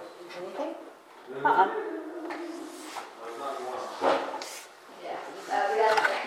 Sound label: noise; Old Business Building on Main Street in Greenwood, South Carolina